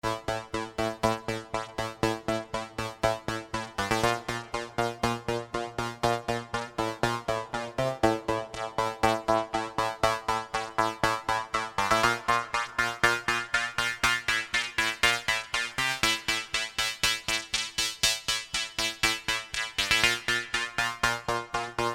russian filter long thin sequence

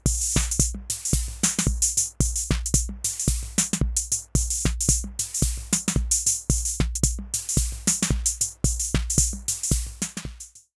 Sequence SD und HH Dec Mod HH Tune Mod

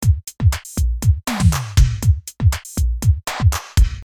Electronic

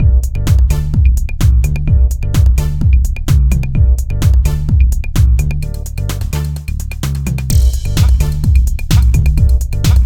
SR18 Techno

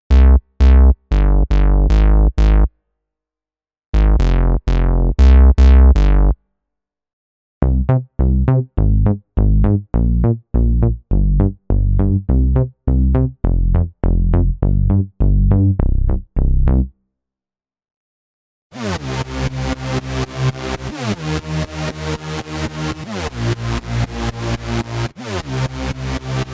Roland FP E50 Synthesizer

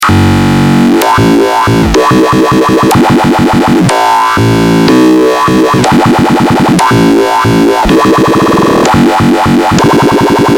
dubstep; mfb dominion

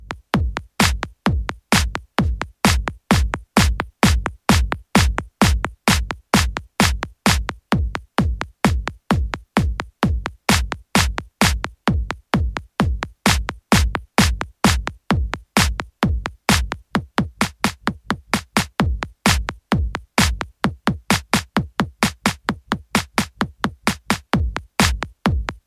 delay; evo

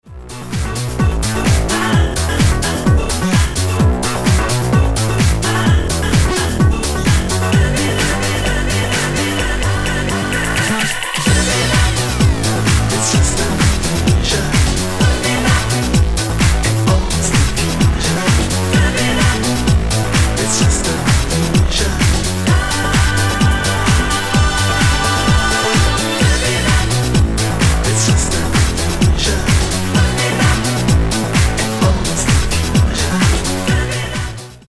Xone 92 original

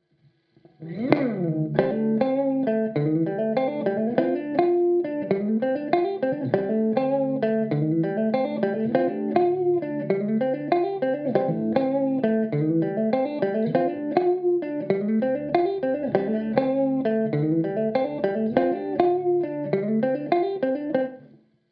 compr on

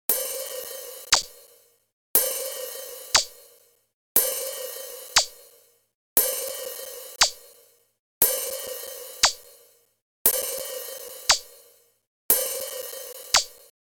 Shift Register

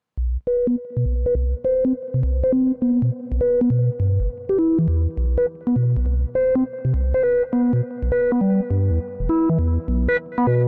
Binson Echorec Synth Delay; T-REX

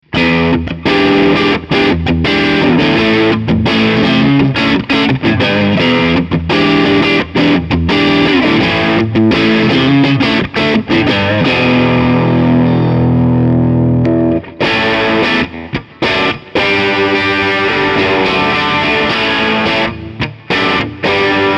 Engl Fireball 25 Clean Channel cranked up

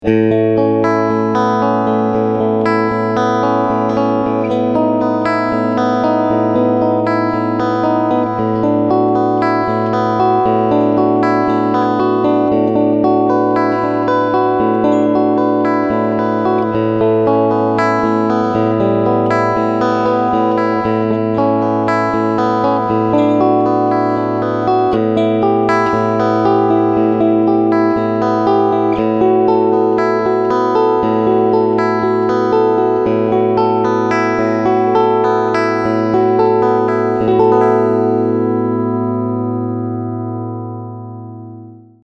Clean Pos.

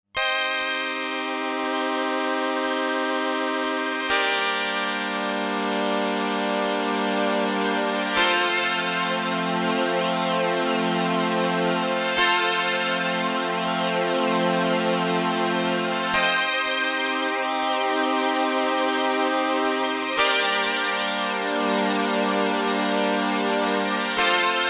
Demora CT 101 Flanger

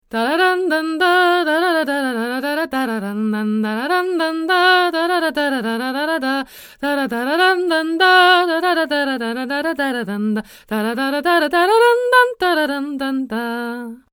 Mojave MA301fet H2 far

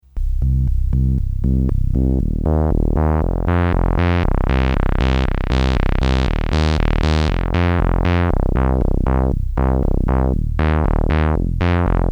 Osc Filter Mod Cross Modulation

